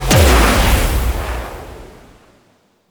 Song Bubble Shockwave; Enemies; Abilities; Warframe; Teralyst; Bigalyst

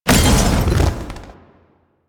Wolf Gangster Molotov Explosion A; Warframe; Grineer Wolf Gang

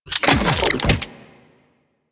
Briefcase Launcher Idle Fidget; Executive; Warframe; Weapons; Corpus

